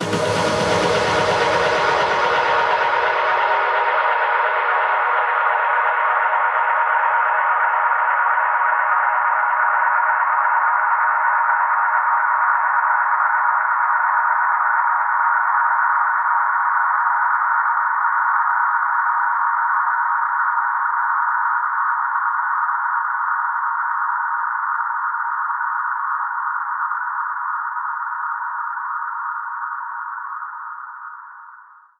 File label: Reverb Athmospheres, FX Vocals; Vengeance Samples